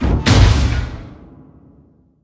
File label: Pneumatic press